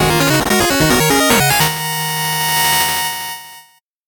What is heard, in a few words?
8bit jingle normal